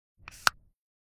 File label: Email, Nokia alert tones; Asha 301 Southeast Asia